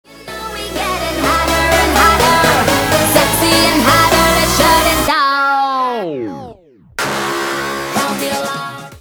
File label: Buzzer